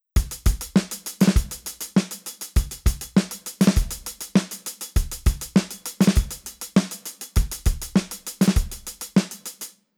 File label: drum humanize